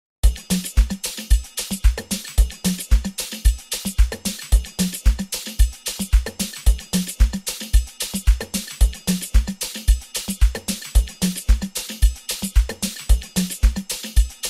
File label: Amapiano Mix